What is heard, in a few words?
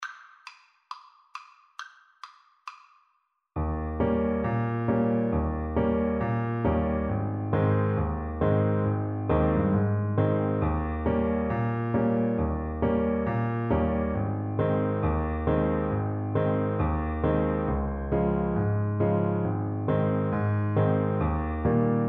rag, Trombone